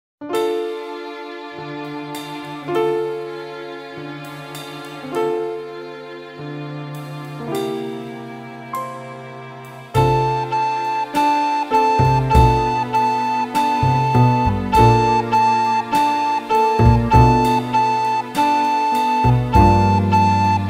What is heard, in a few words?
backing track; recorder-tastic